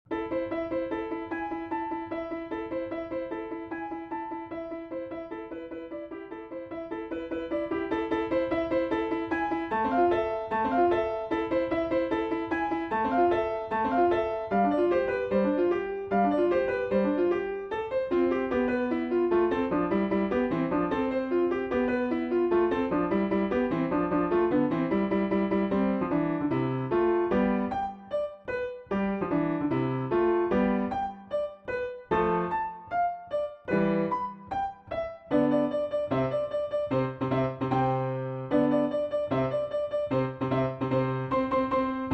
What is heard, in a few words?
Piano